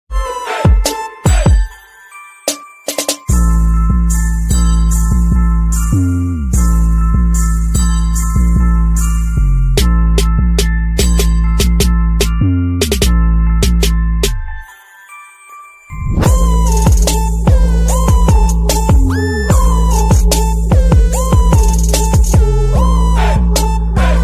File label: Unique BGM Ringtone